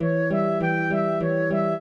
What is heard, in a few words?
trio, flute harp; Mozart